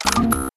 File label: rocket pack boosters not ready; tf